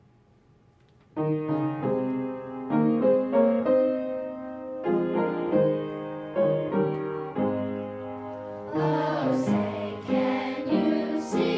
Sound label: star spangled banner chorus